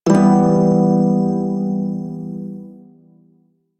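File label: Prime Shawzin Major Chord C; Music; Warframe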